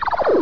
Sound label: Delete Line; Bedlam 2 1.1.1; Bedlam Sounds